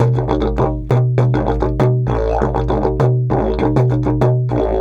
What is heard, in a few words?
DIDGE 100BPM